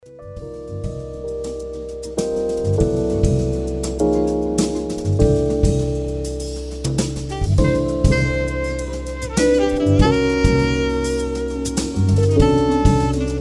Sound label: Quintet Cocktail Jazz Live Event